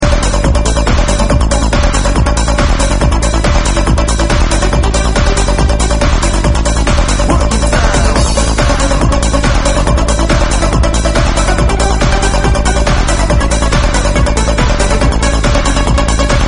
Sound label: groove trance mix